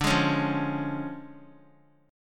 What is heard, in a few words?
D Minor Major 7th Flat 5th, down, Guitar; DmM7b5 strummed; JGuitar